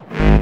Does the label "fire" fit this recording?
No